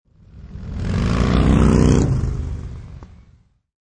Motorcycle Drive By Left to Right, Motorcycle drives by; Part of Motorcycles (Sound Effects:Vehicles)